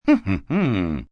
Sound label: Hm Hm Hm Playful, male; Reaction